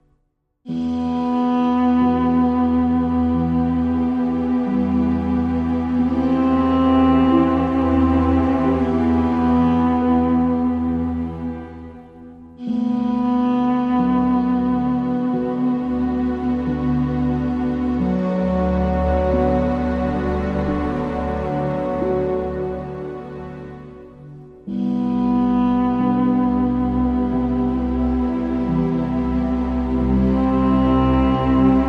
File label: No Choir